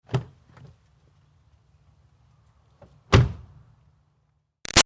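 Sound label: Car Highlander Door Open-Close Med Far; 2005 Toyota Highlander V6; Cars; Vehicles; Big Room Sound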